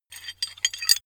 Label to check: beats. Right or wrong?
Wrong